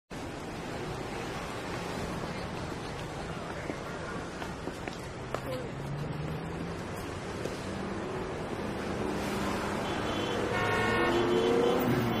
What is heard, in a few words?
City sound effect downtown